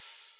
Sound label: Drums Cymbal One Shot